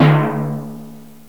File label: Timpani D; TG300B